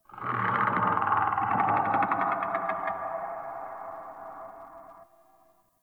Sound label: Corrupted Ode Three Trees Talk; Ambience; One Offs